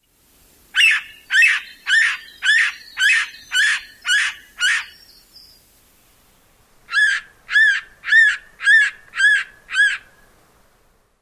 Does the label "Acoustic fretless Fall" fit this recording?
No